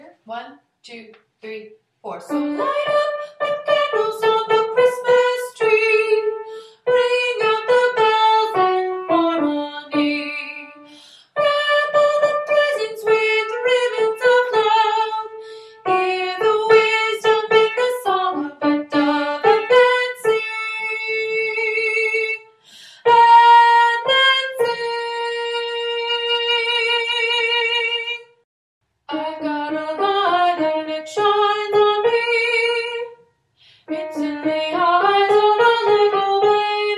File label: Soprano